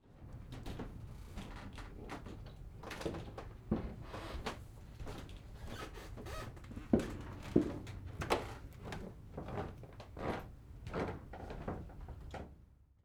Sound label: Foley Footstep Person Walking On Second Floor Random Movement; Foley Footsteps